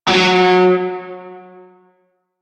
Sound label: Shawzin Oct Two F Sharp; Music; Warframe; Narmer Shawzin